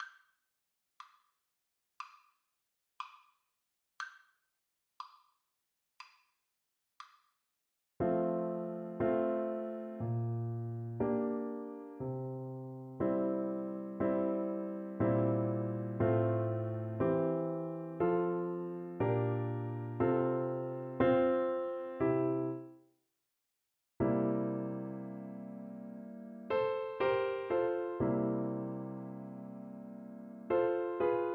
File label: romance, french horn